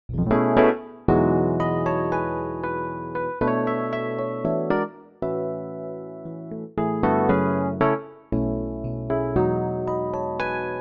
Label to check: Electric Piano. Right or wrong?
Right